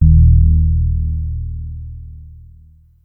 Synth oneshot Long Attack Eb, Synth Bass One Shots; Slate Digital BASSHOLE Sample Pack